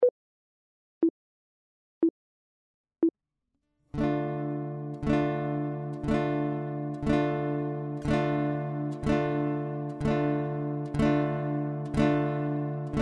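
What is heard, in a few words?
chord, guitar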